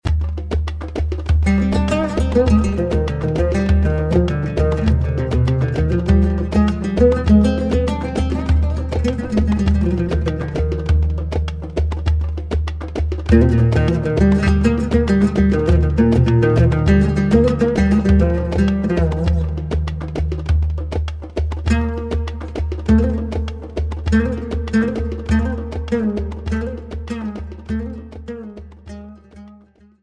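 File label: Shagan, Taksim Oud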